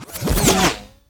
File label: Fastwalk; Fly For Insanity